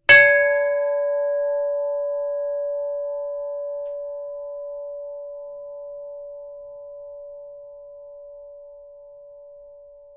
SHIPS BELL RING; Tomishima Maru 1952; Various ships bells; Boats (Sound Effects:Vehicles); SoundEffects-Foundation4

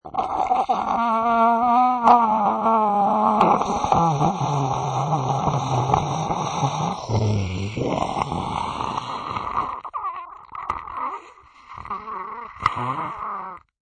Dying Man, Man being choked to death; Dying Man3; Talking Sound Effects; Voice

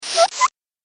Cartoon Pumping Sound; Cartoon Sound Design Sound Effects

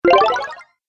Restore Health, Video Game Sound Effects

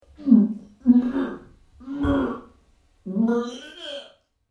Sick Sound Effect Throwing Up In Bathroom; Vomiting Sound Effects; human sound effect

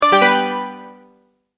multimedia tone, Goodbye; McKinney Sound

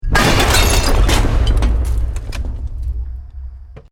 EXPLOSION MEDIUM BRICK WOOD GLASS NO FIRE

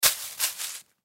Impact Hay Object Drop On Hay Roll; Low Impacts; Sound Effects:Impacts; Blastwave

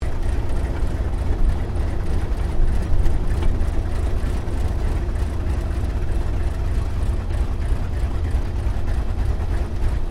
AIRCRAFT T-28D TROJAN TAXI INTERIOR; Airplanes; Vehicles

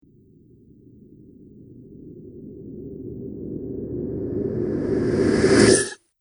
Zombie Monster Ghastly Breath; Zomby Sound Effects

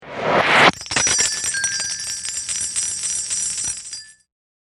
Whip Chimes; Bells; Musical

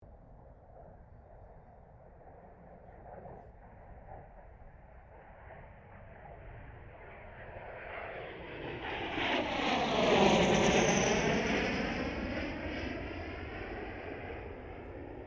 AIRCRAFT CA-27 SABRE PASS STEREO

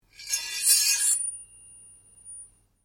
Blade Scrape Slow Ring, Sword; Foley sounds for melee weapons; Swords; Combat

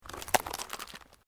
Branches Dead Break; Material components for constructing more complex sounds; Breaking

